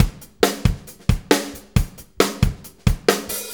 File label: Drum Tools, Loops